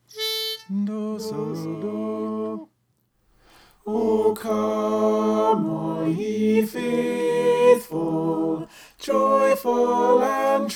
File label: soprano